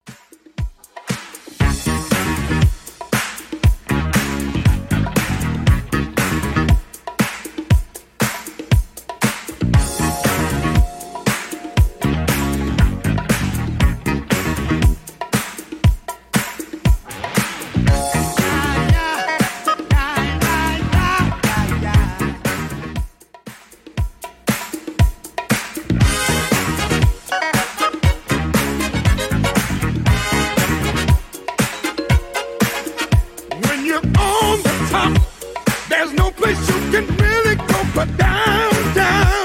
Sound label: 80s Redrum 118bpm